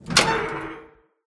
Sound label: metal door hatch open squeak; SFX; Environment; Doors; ProSoundCollection v1 3